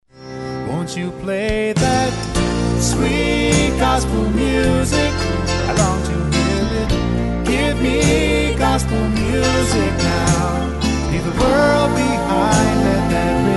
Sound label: Sweet Gospel Music